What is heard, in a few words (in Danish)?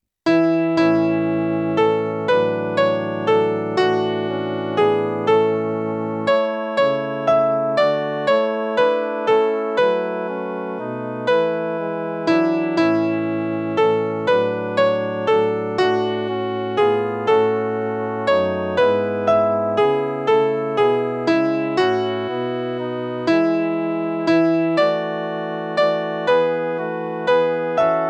midi